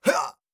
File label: Attack Grunt; Afterbern Democralypse; Windows